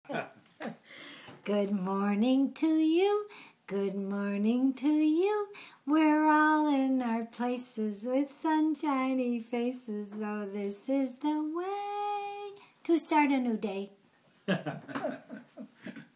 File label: Goodmorning Song